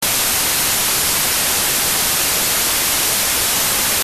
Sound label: white noise